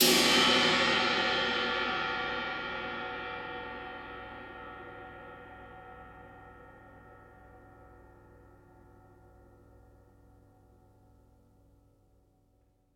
Multi Crash Lo, drum

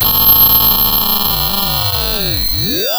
Sound of a cat meowing

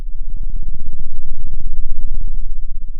pop, Sound of an engine revving

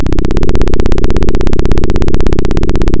Sound of a plane taking off

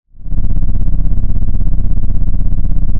Sound of an engine revving

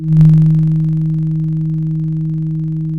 Sound of a church bell

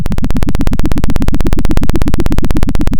pop, Sound of a helicopter

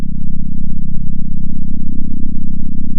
center, sound of a small motorcycle

